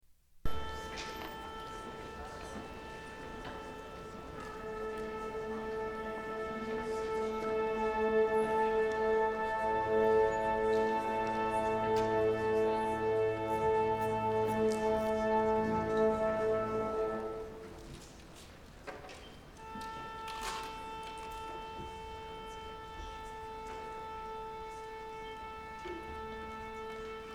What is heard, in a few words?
instrumental